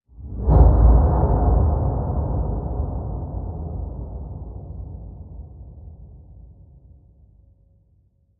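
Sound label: Whoosh; Squall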